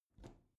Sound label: bathroom cabinet open soft slow; objekte; tueren